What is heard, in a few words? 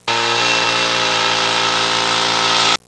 weed eater; Sound Effects for Multimedia - Volume 1